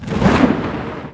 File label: attack